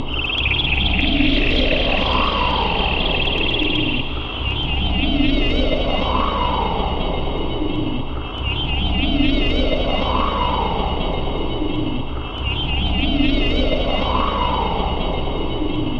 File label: fracture loop; svencoop